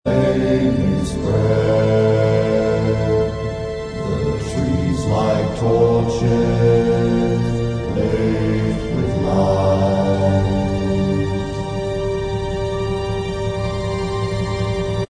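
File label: backing vocals, backing track; dwarf cast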